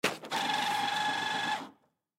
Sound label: Paper Towel Dispenser; Paper Towel Dispenser Automatic Dispense Single Sheet Public Bathroom; Kitchen; Industry